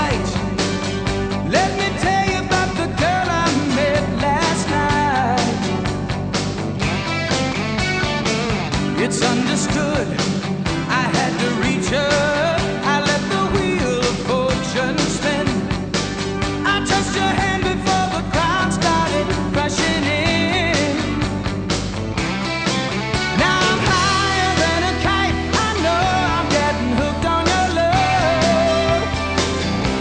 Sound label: rock